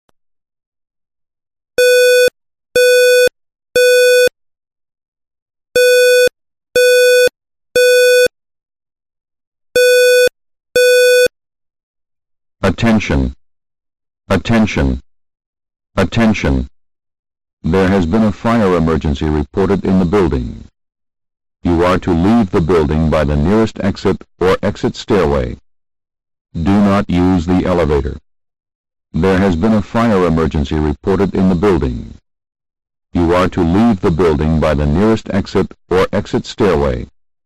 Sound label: Fire Alarm